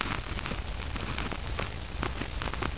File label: torch burn; baseq2; dod